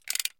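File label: mechanical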